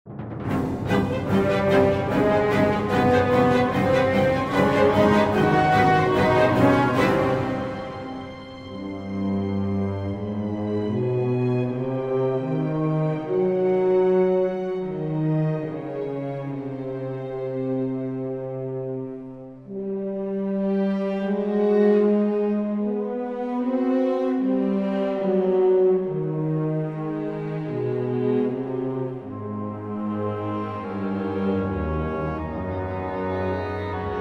Music; Age of Empires III; cinematics

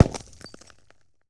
Bullet Into Windshield; Sony Pictures Sound Effects Series; Ricochets - Hits - Whiz Bys

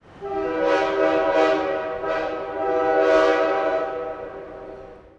Train Horn Blasts; Sony Pictures Sound Effects Series; Vehicles; Trains

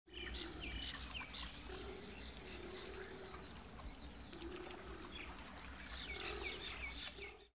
Swamp; Age of Empires III; cinematics

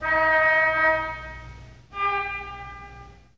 Car Horn; Sony Pictures Sound Effects Series; Period Vehicles

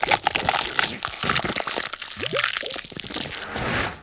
Bone Crunch & Snap Liquid, Hand To Hand Combat; Sony Pictures Sound Effects Series

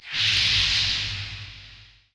frosts; RYL 2

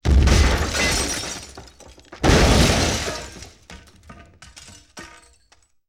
Explosion Medium Various Debris; Sony Pictures Sound Effects Series

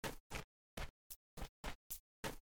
Running Steps; Age of Empires III; cinematics

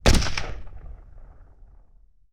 AK-47 Single Shot; Weapons & Firearms; Sony Pictures Sound Effects Series

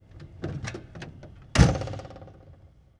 Diesel Truck Door Open Close; Sony Pictures Sound Effects Series; Period Vehicles

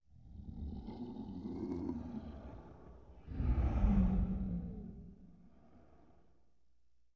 Deathly Breathing, Vocals - Monster; Sony Pictures Sound Effects Series

